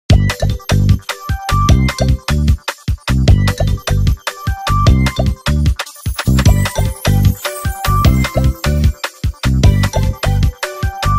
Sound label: Minigame- Balloon Popping (Normal); Serdin; Grand Chase